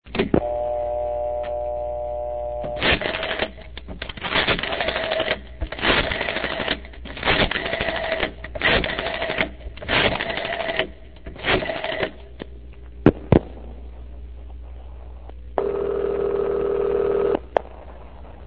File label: old dial